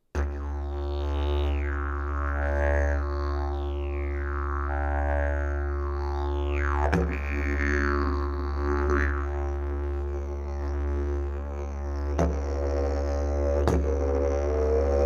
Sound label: didgeridoo